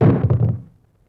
Dull Wood Hit Bounce; Vintage Cartoon; Drums & Percussion

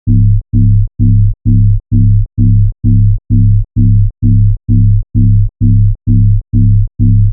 Bass Loop 130 C#; Techno; Ghosthack; Free Techno Sounds 2024

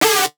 synth screech oneshot D dry; Synth Hits; GhostHack

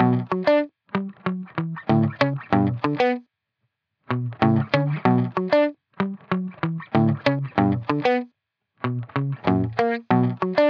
95 BPM B Min Muted Guitar; Loop Stems; Cymatics; Miami Guitar Pack - Pt 1; Breeze

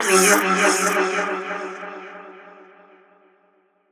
Voice A 140 BPM, psytrance; Voices; Hits; Ghosthack; Psytrance Visions Volume 2

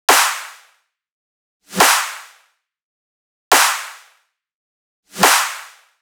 Riddim Drum Loop 140 Snare Clap; Ghosthack; Riddim Essentials Volume 2; Full Drum Loops

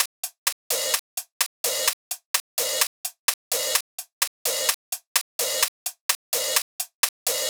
Shuffle Top Drum Loop, 128 BPM; Drums; Loops; Cymatics; EDM Starter Pack

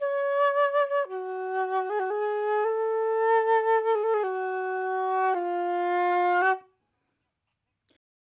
flute loop 113 BPM F# Min, dry; Melodics; Instrument Loops; Flutes; Cymatics; Cobra Hip Hop Sample Pack

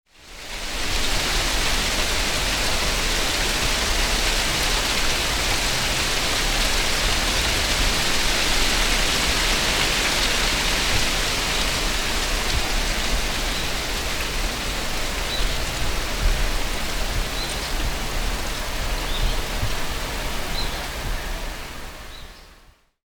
Nature

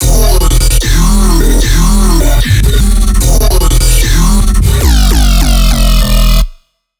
150 Water Bot, Dubstep Bass Loops

